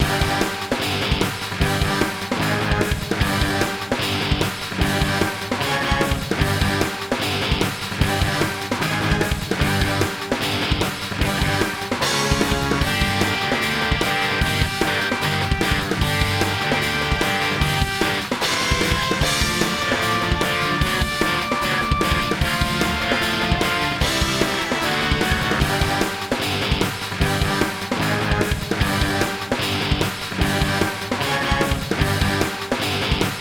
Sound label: Combat Loop